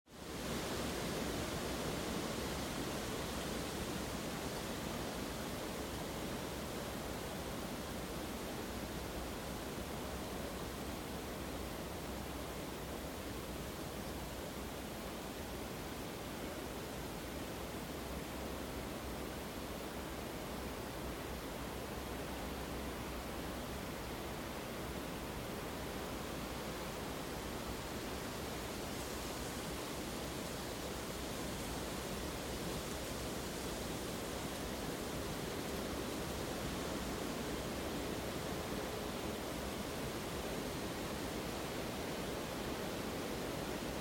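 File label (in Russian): спокойный ветер; Звук ветра в лесу; звук ветра в листве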